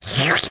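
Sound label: slurping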